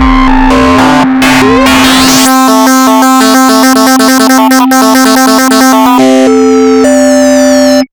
DISTO GAMES, Big Beat Samples; Big Beat Samples & More; AKAI; DISTOTHER KT

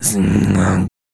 VOICE FX, Dance Vocals

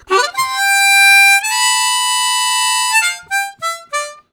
Harmonica, Riffs; Zero G

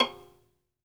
SOLO VI, Synclavier Strings; ILIO

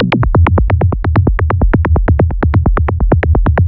Industrial Beats

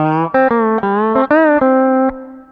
RHYTHM TWANG; Bass; Sonic Foundry (Sony Creative Software); Not Just Another Pretty Bass

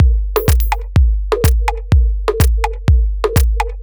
A# 125bpm, House Drumloops; 90 s Sample CDs; Zero-G House Fabrik Drumloops; ZG Drumloops

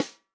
snare drum, HIPHOP SNARE CHROMATIC

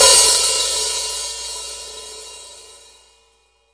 HH OP, Drum Dry; East Collexion; Drum 1 Dry

